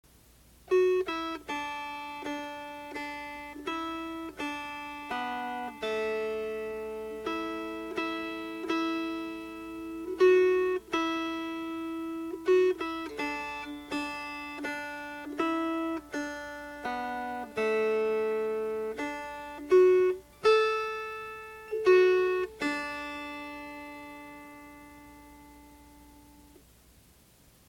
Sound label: Reuben Ranzo Melody